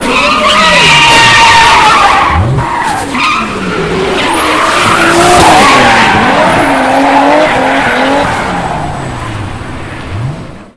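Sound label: car drift sounds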